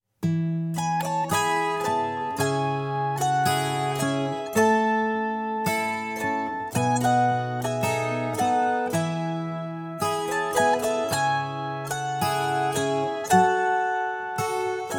autoharp fragment

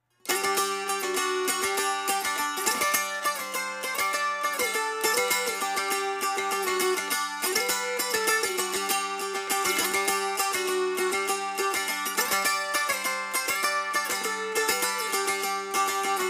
mountain dulcimer

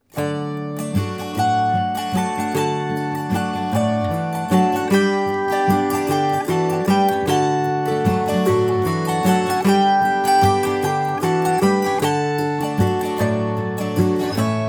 autoharp fragment

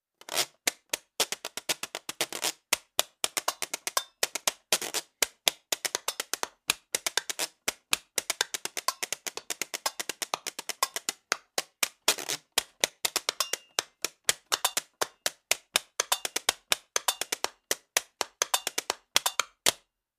washboard

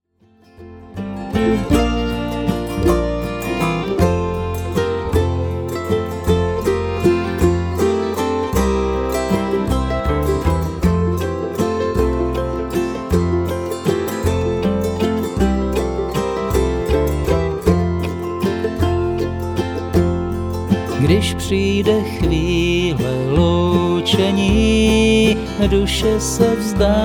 autoharp

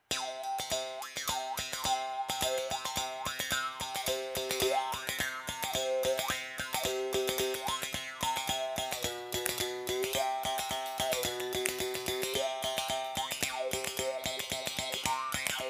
mouthbow

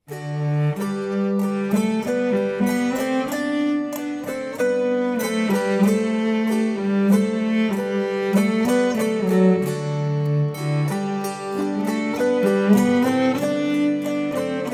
autoharp

